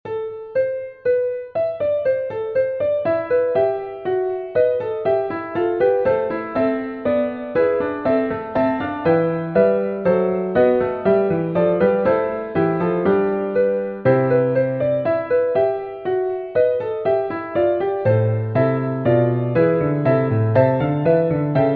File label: Fugue in a minor; from the Langloz manuscript